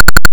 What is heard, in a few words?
fuel full, a short beep (~1450 Hz, ~6 ms); plays a short beep (~1450 Hz, ~6 ms) via the ROM BEEPER routine; River Raid